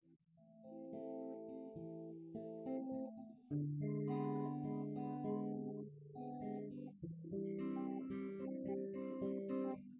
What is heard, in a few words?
nodrums synthconv; SiSEC08; SiSEC underdetermined